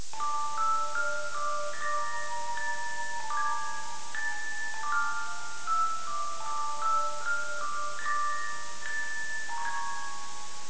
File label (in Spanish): sonajero; cstrike; deathrun jigsaw